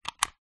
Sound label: plastic slide toggle switch; foley